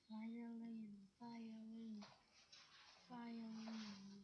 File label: violin